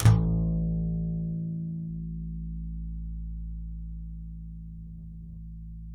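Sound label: acoustic bass slap A1, right; Masterbits CLIMAX 7 - Bass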